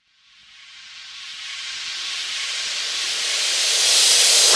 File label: reverse, FX; Vengeance Samples